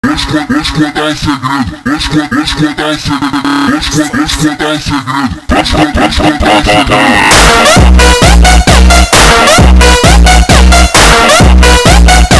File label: Funk